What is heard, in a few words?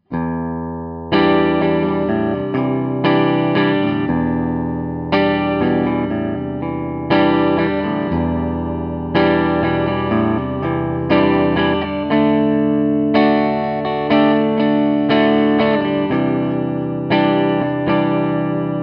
clean